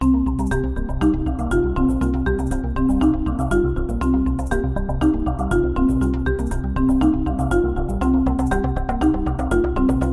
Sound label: deep investigation; zapsplat